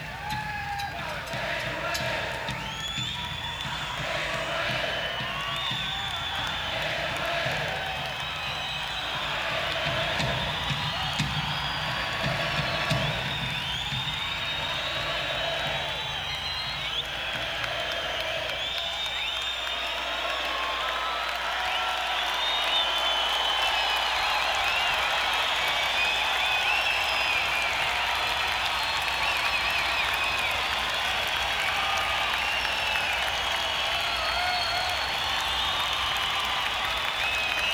Chant

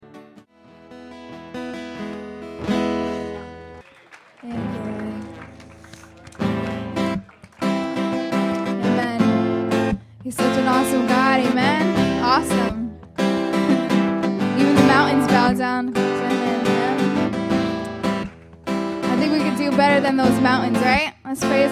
Acoustic Sampler

